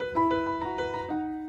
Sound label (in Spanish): alerta piano